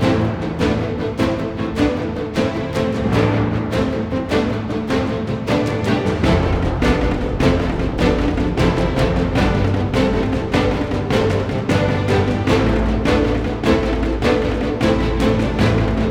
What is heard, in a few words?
Battle Loop - Intense; A Knight's Quest